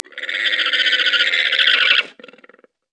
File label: llama; ラマ; 鳴き声１